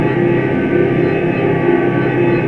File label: gravitation field; LoL; viktor